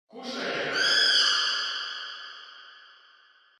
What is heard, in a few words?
construction